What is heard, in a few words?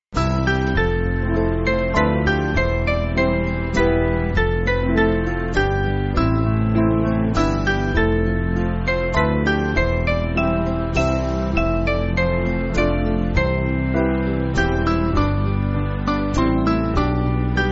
Band, Free Music Backing Files for Churches; I Vow To Thee; Thaxted